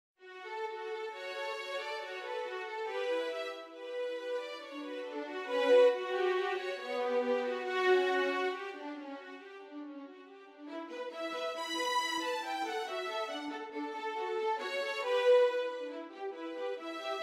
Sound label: Duo 2 violins